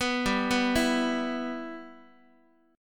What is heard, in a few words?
G 6th, Guitar; G6 arpeggiated